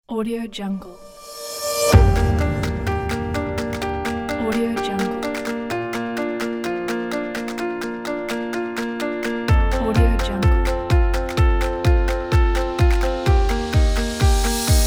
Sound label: corporate inspiring uplifting music